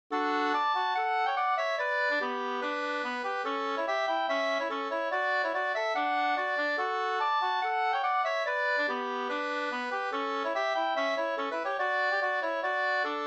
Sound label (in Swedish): schottis; cykelreparator Holm